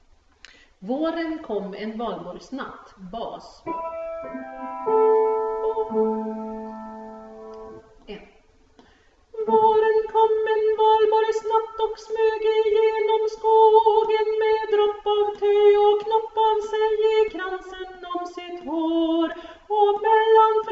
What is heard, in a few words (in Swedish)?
Valborgsnatt bas